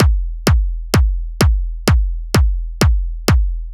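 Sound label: Drum Loop 128BPM bass drum; VEC5 Drumloops; VEC5 Multi Loops; Loop Elements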